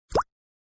water notification water sound message

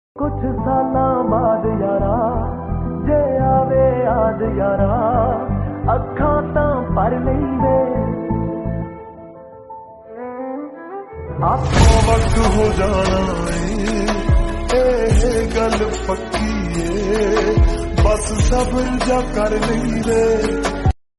aesthetic lofi punjabi song ringtone

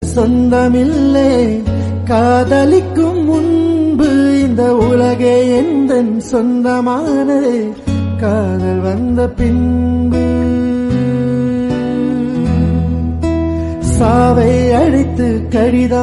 waiting songs in tamil

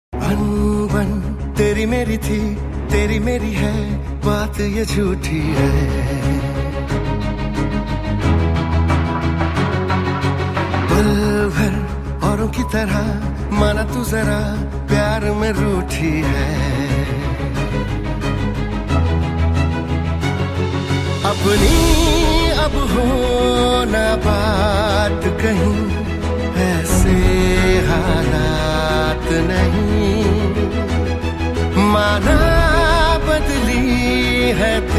flute

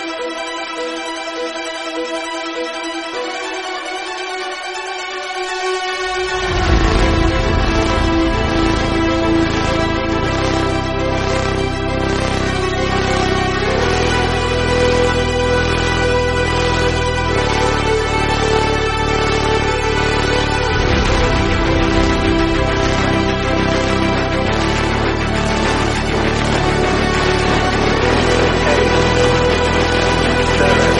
techno bgm; oppenheimer

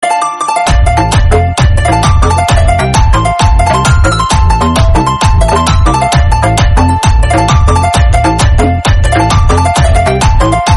bgm instrumental; kamen rider

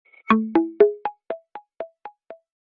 message tones notification; vivo v15

